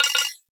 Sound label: Hub Comp Beep; Ambience; Warframe; Tenno Hub